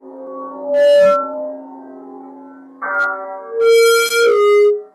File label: Feedback